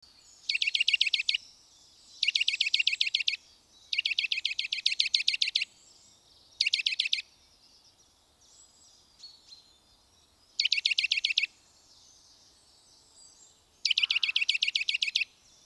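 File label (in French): sittelle torchepot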